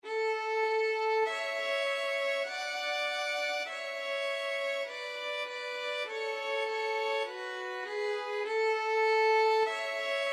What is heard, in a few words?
twinkle twinkle, violin duet